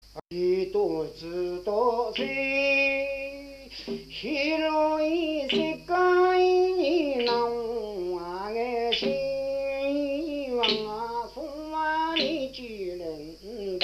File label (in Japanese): nitirenkazoe, minyou